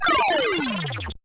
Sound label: Commando Alert; ringtones